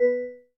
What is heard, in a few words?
Class Harp, notifications